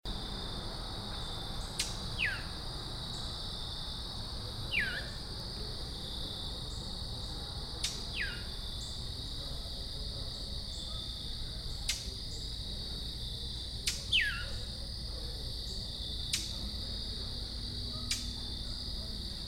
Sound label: Brown Thrasher; Calvert MD